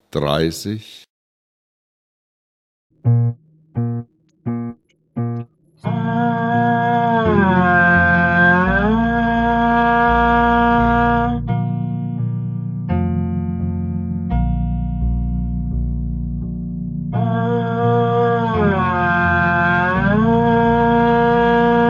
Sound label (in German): Klang, Hornschule